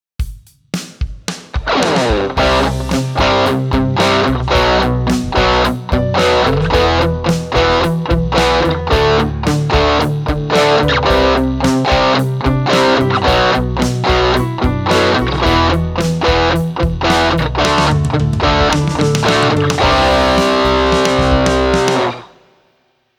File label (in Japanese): DSドライミックス; boss gx1